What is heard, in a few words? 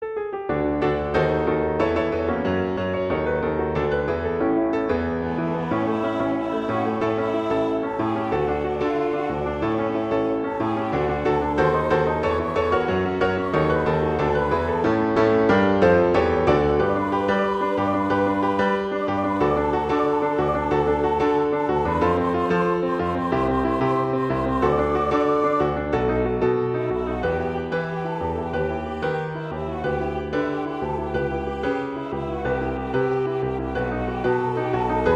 Piano-Vocal; Party